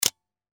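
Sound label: camera click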